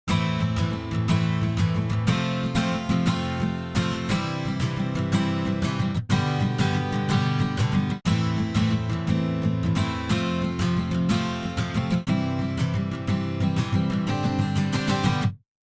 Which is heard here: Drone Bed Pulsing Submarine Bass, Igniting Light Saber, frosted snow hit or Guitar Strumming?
Guitar Strumming